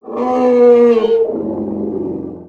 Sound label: Pet Lion Howl; creatures pets